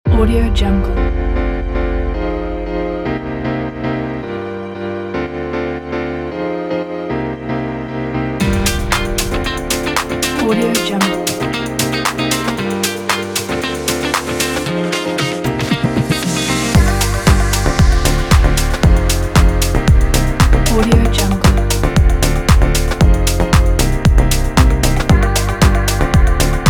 fashion house background

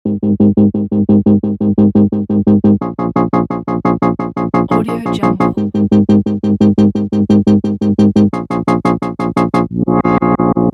light bassy beat; marblespace

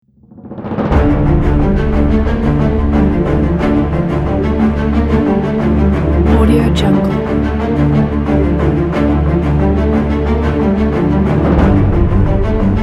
game trailer

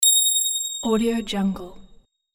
new message; avocado-sound

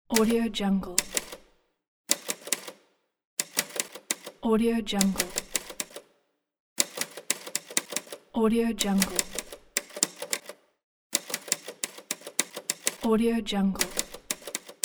typewriter machine typing sounds

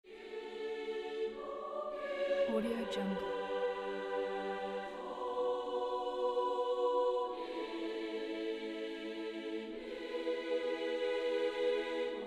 choir

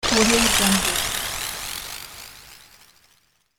handy teleport

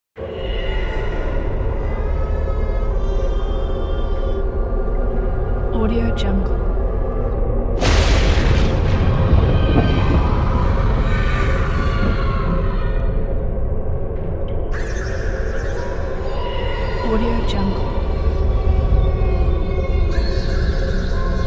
horror ambient

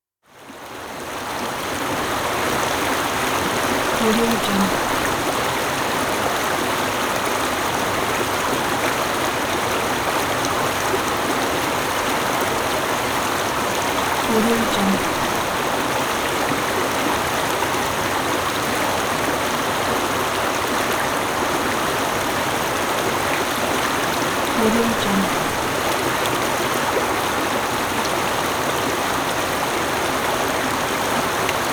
high mountain river rapids; hillmusicmedia

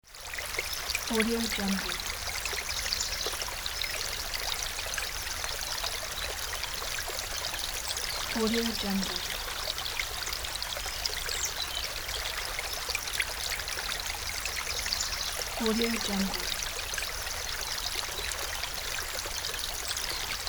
water flow

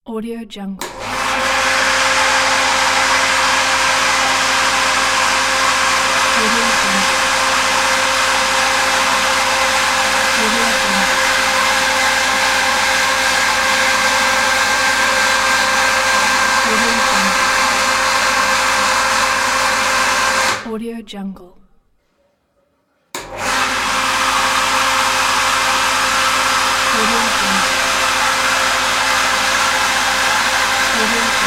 pumping plant; backupcrew

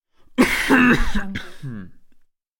man coughing intensively

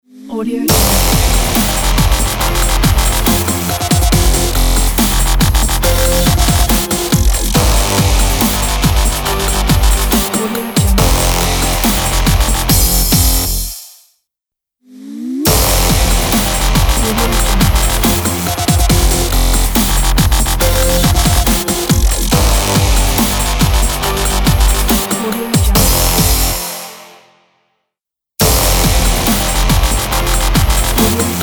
dubstep logo intro

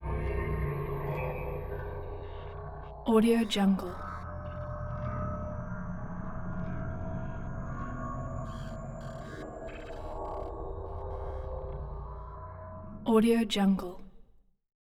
bonus weird kyma soundscapes; robotic lifeforms 2

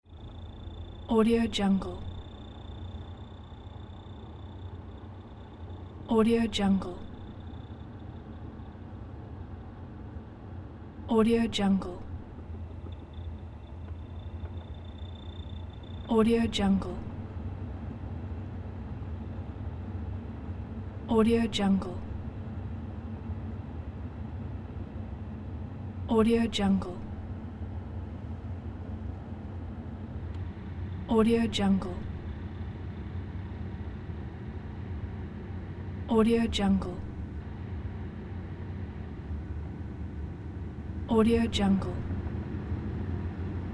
car air conditioning different ventilation modes; tonwagensfxlab

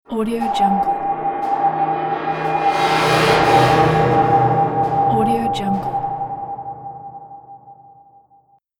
alien life scanner

